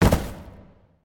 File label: victory bodyfall; smite; chiron